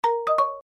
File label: online, alert